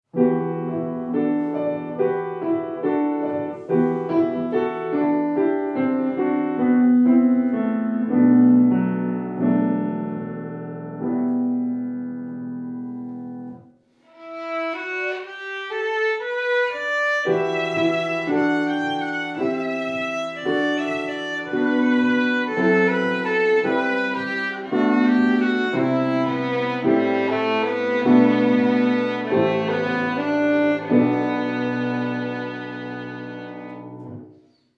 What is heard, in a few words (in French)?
chant, violon